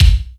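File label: BD reverb, Yamaha RY-10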